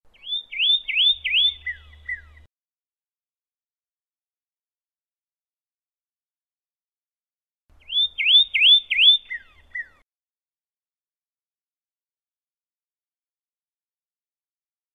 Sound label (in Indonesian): suara kicauan burung pyrrhuloxia